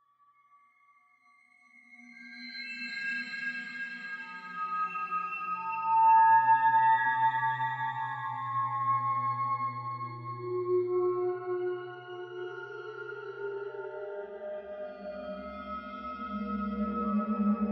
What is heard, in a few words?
ambience